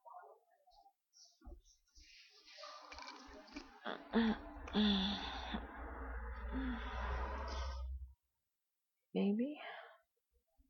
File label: Singing you a lullaby on a noisy night, Noise, Dog barks, People chatting outside; People chatting outside my apartment, drunk; F4A